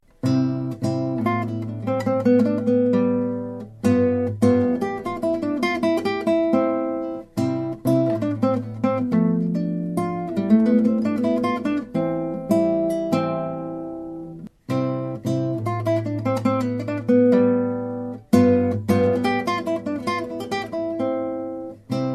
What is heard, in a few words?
allegro moderato, kuffner